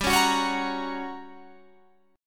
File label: G Minor Major 7th Flat 5th, G, down, Guitar, Drop D